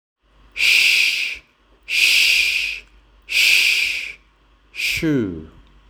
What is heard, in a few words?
shoe